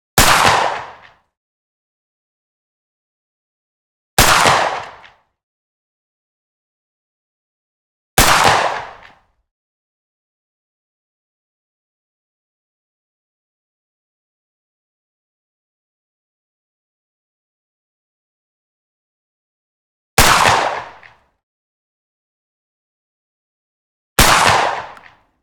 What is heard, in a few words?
saiga 12 12g solid slug left off axis clean single shots; 1m; mkh416; sonniss gdc